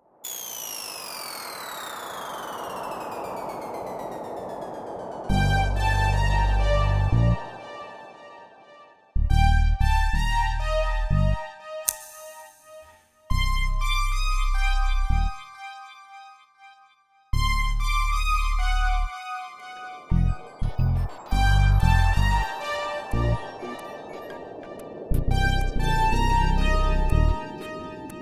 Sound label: comedy german synth